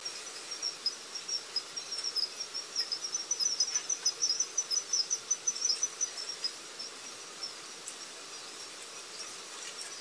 kanetataki

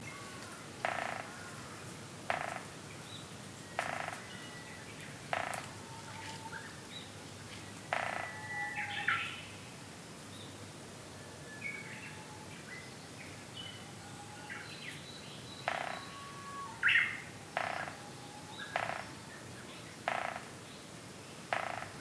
kogera

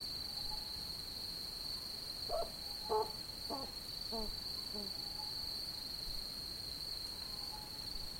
ohkonoha

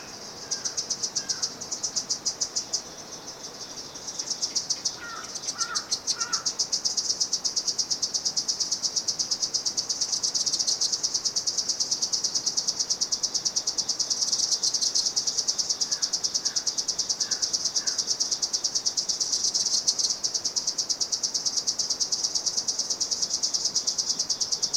kuroiwazemi

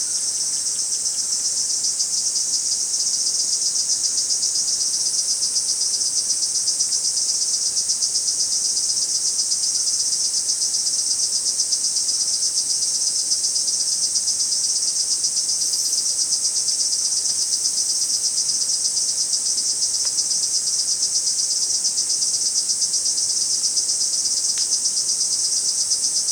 kuroiwazemi

